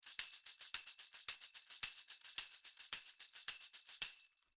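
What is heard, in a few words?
Beyerdynamic M 90 Pro X Tambourine 30cm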